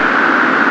WIND; OSCAR; AKAI S-Series CD-ROM Sound Library VOL-8; SFX

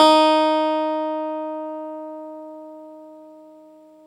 CLAVINET EB4; Big Fish Audio - First Call Vintage Keyboards

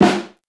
Fairlight CMI III Snare

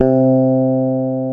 BREATH; MORPHEUS

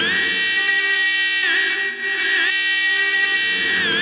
D TRITONE; Distored Reality

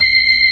B3, Drawbars

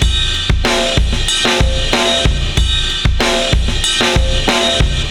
Beat Loops; Zero-G - Monster Beats

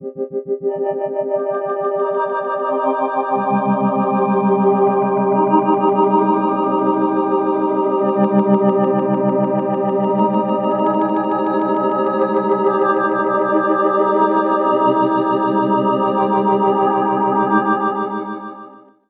Velvet Trems CMin; Zero-G Ambiosis; Light

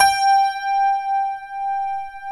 HRP RCDR&FLT

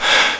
KIT G, DANCE KIT; Best Service ProSamples vol.55 - Retro Sampler; AKAI

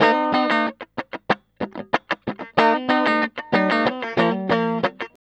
TELE DUAL E 3, guitar; TELE DUO; Spectrasonics; Hans Zimmer Guitars VOL-1

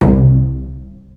pizz C1; PlatinumPhattCD1; E-MU Producer Series Vol. 8 – Platinum Phatt; Best of Phatt

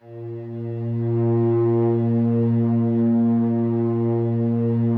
slow strings A#1, Yamaha VP-1; Music Store; 90's Sample CDs

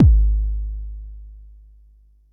Bassdrum, Jomox Xbase09

